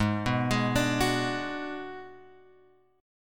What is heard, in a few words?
Ab Minor 7th Flat 5th Ab, down, Guitar, Open G